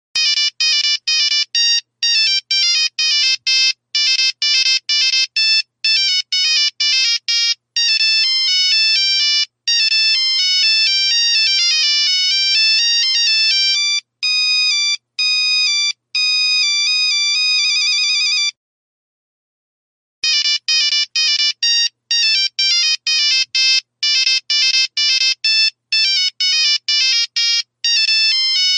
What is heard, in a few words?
very old classical phone tone ringtone; nokia ringtones